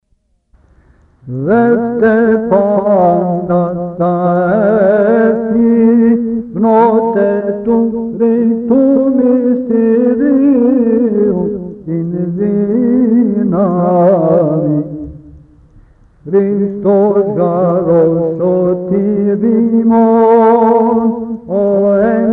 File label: Music; apocalypse